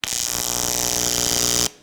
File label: electric, power sink